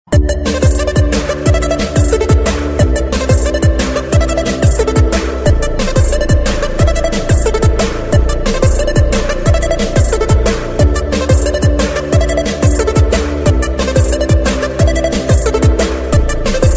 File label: Electronic Cool